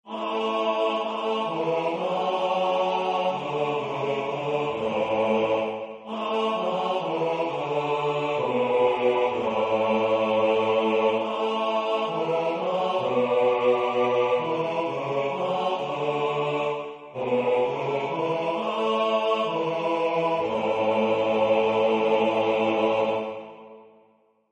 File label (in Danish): bas; Min Jesus, lad mit hjerte få